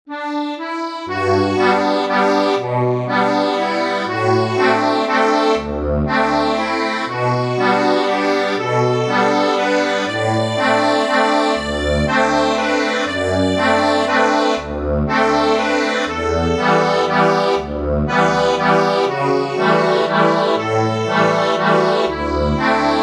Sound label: accordion